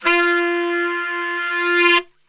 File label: F 2 SAX, Brass Super Section; Best Service